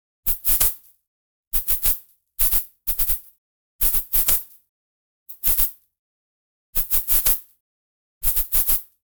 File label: graffiti spray